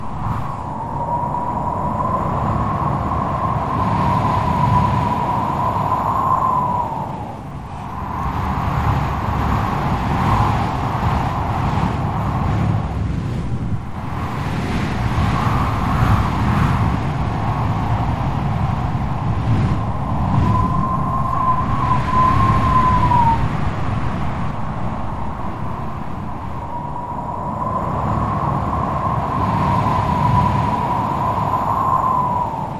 Strong Stormy Wind Looping

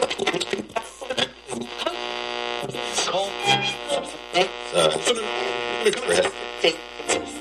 Radio Scroll Am

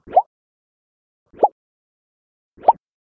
Multimedia Pops

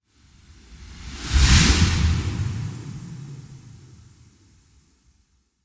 Dust Whooshes

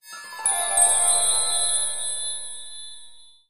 Magic Spells With Harp And Chimes

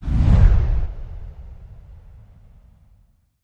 Low Air Blow Whoosh

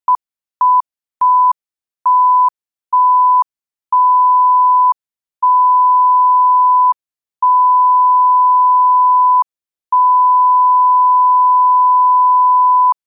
Censore Beeps Set

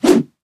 Whip Whoosh Swoosh

